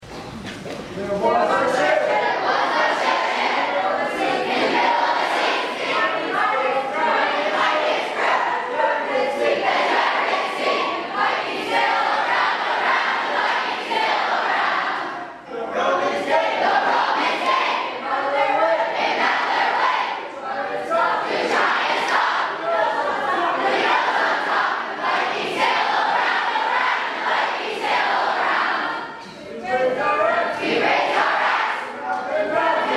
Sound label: Cheer